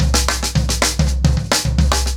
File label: 110BPM, SNARE TOM SOLOS